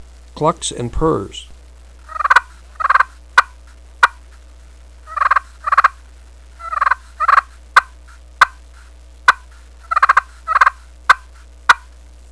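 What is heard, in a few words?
clucks purrs, turkey call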